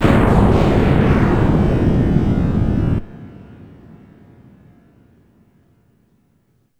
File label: thunder; BERZARRESOUNDZ